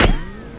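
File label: ricochet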